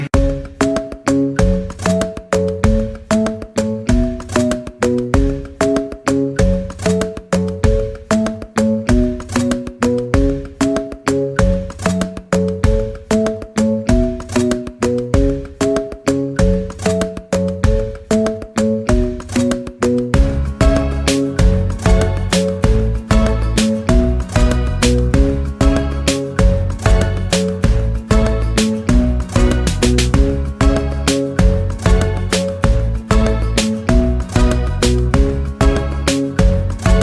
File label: Instrumental